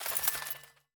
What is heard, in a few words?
Feather